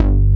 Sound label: BIG MOOG BS; Pro-Rec - Synthsonic; BASSES